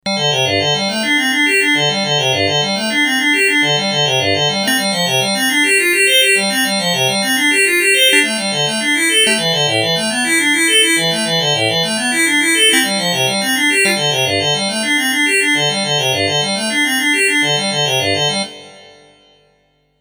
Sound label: melody